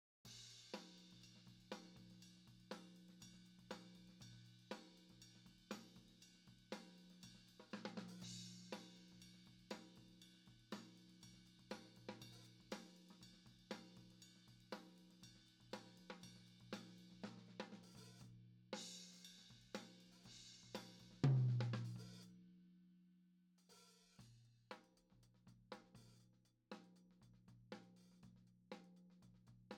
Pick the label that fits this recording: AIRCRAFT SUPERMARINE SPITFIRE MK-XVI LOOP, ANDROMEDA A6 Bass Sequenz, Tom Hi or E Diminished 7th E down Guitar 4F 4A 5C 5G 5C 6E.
Tom Hi